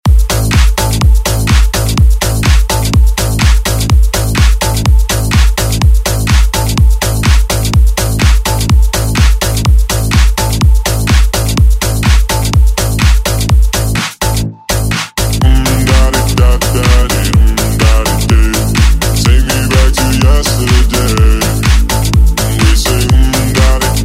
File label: Dance Redrum Intro